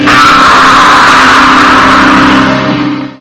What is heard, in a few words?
aaah